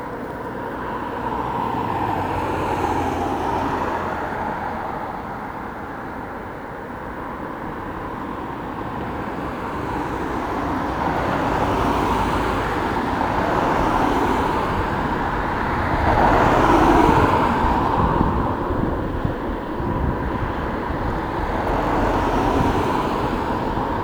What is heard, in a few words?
Road traffic